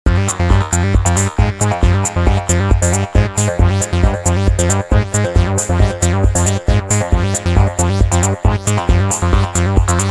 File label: Pressure Bass